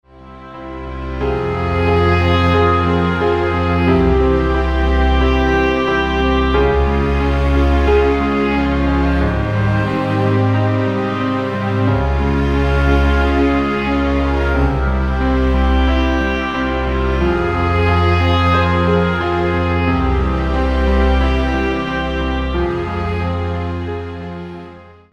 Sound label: instrumental; Agnus Dei Lamb Of God